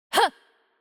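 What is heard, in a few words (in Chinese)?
Attack Light, Chinese; bloom minigame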